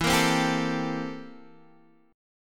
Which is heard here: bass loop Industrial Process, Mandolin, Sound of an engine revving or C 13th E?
C 13th E